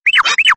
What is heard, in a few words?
R2d2 Sms